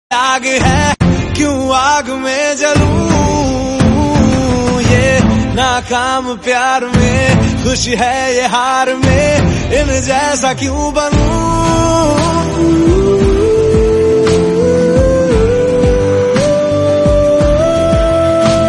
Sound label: Hindi bollywood